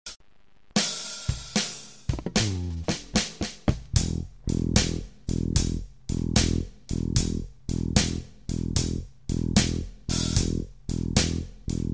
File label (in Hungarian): blues kiseret egyszeruen alap, gitár